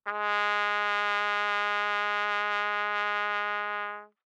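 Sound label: Trumpet vibrato ff Ab3 stereo, Bb Trumpet; MIS Pitches - 2014; Brass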